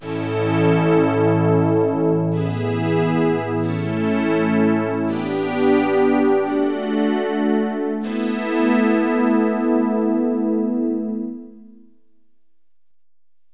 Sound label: metal; Roland; An Invitation To The Roland World Of Music